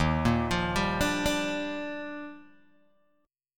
D sharp Major 9th, Guitar Open G; arpeggiated

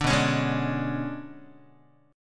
B-Minor 6th Add 9th B down Guitar; Bm6add9 strummed; 5,7,4,6,6,x; JGuitar

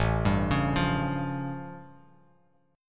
A Augmented 7th A Bass Standard

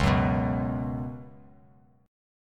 Db 7th Suspended 4th Db down; JGuitar

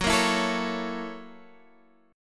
Gb 7th Sharp 9th Gb Guitar Standard; strummed; Gb7#9; x,9,8,9,10,9; JGuitar

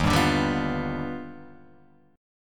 Eb Augmented Major 9th Eb down Guitar Open G

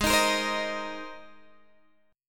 G sharp Major Add 9th, Guitar Open G

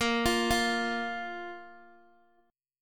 Bb 5th, Guitar; 17,20,17,x,x,x; 4F,4A,5C,5G,5C,6E; Bb5 arpeggiated; JGuitar

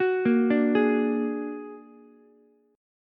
A sharp Augmented 7th A sharp Ukulele; A#+7 arpeggiated; JGuitar

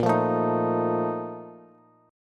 A sharp Major 7th Suspended 4th, Bass; strummed

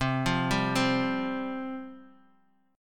C Suspended 4th C, Bass Standard; Csus4 arpeggiated; 20,20,17,17; JGuitar

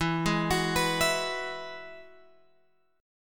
E Major Add 9th; Eadd9 Chord {12 11 X 11 12 12} arpeggiated

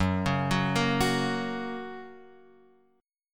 Gb Major Gb down Guitar Open F; Gb arpeggiated; JGuitar

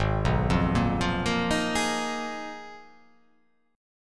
Ab Minor 6th Add 9th, Ab; arpeggiated; JGuitar

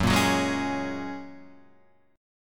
F sharp Major Add 9th, Guitar Standard; F#add9 strummed

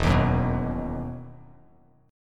E Major 7th Suspended 2nd E down Bass Standard

